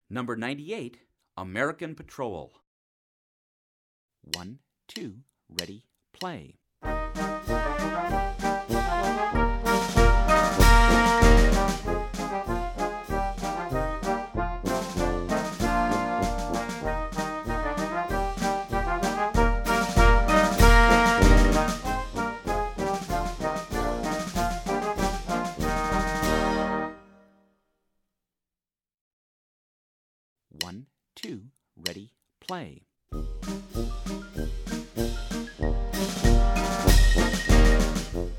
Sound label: american patrol, band